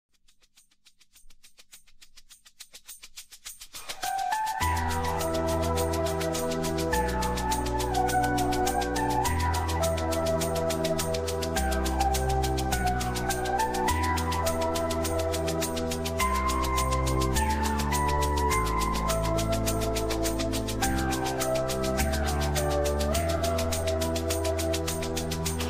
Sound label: italo disco; turko italo